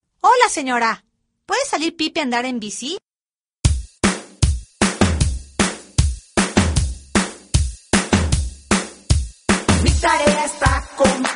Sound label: pipe